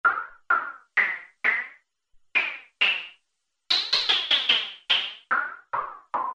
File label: Melodic Taps, Roland Juno-106; Factory Preset